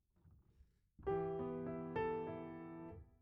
Piano A

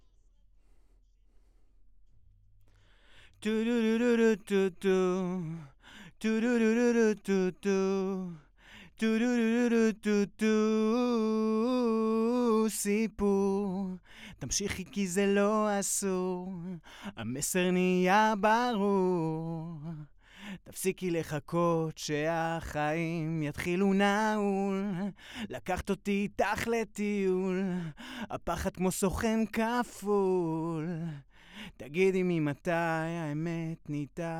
VOX

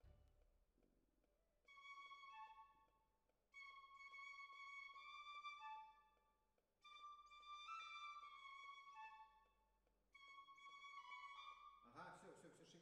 Violas, jazz for piano

